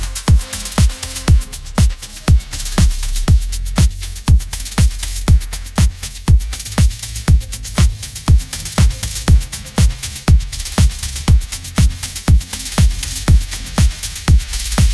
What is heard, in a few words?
upbeat pop melody, ringtones